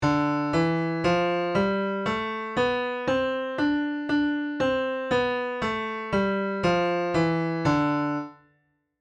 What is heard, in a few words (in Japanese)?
Dメジャースケール3♭